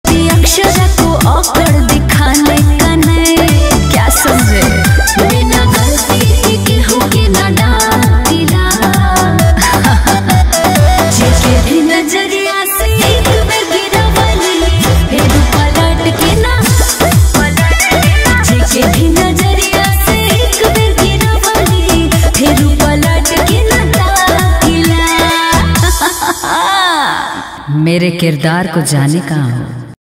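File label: bhojpuri ringtone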